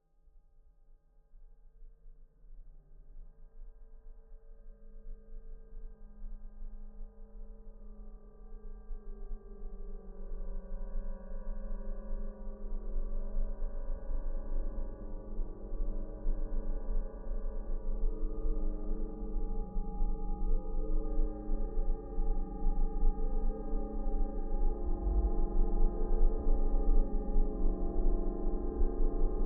lullaby, fft grain meditation; weeklybeats-2016

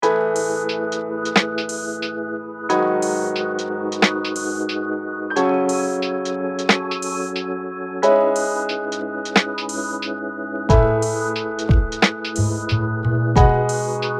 melodic dubstep; weekly beats 2020

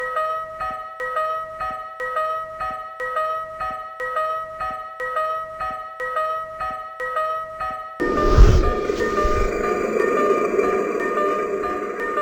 ice cream van tractor tow truck; sample nephews children toys

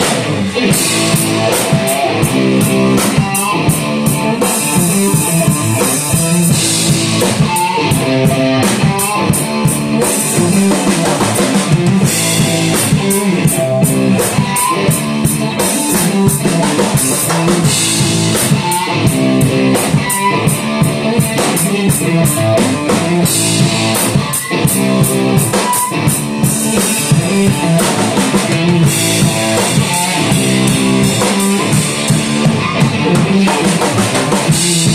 riff and bashing drums; weeklybeats 2026

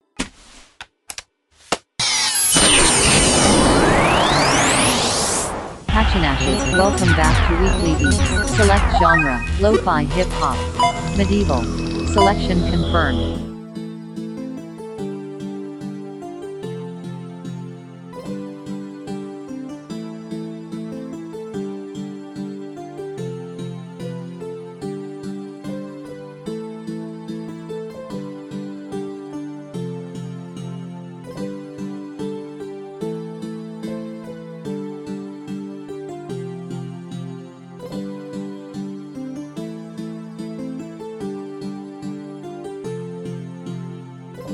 generic rpg town

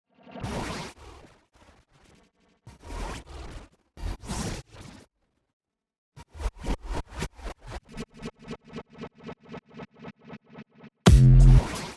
clang; weekly beats 2020

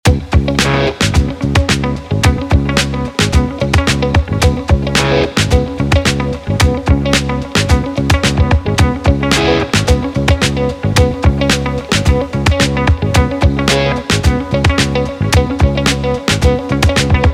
breakbeat; weeklybeats 2024

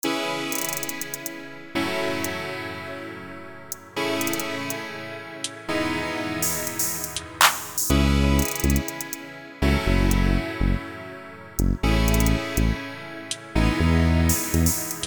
electro hype; weeklybeats-2014

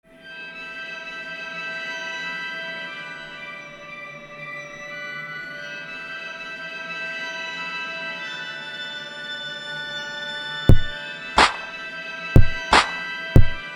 harmonics

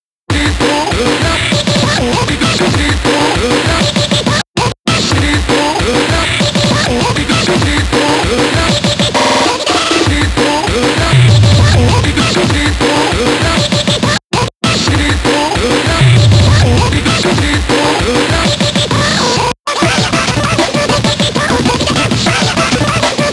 gameboy breakjuke gabba schranzcore; weekly beats 2020

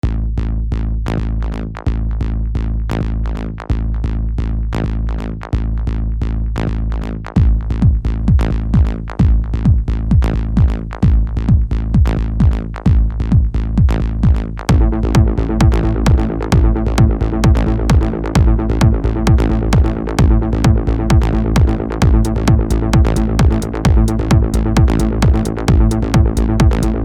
131bpm; side lines; weeklybeats-2020

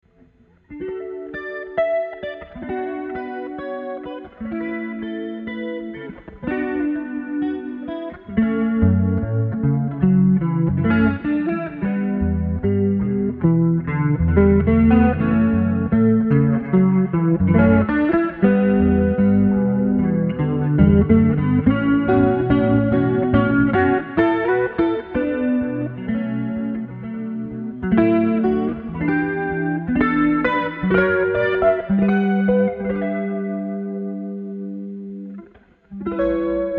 gentle alien guitar

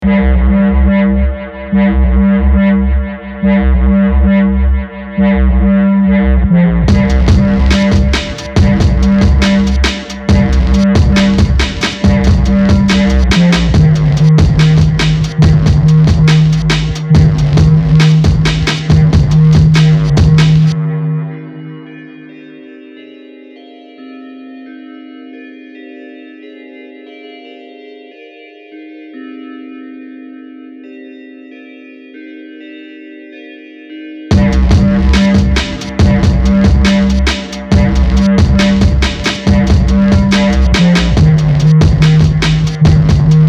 beat 140 bpm; weeklybeats-2014

